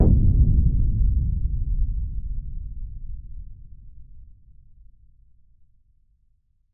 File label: Big Far Explosion